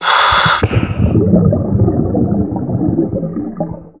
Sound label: wetsuit, items; quakemapping; mod xj18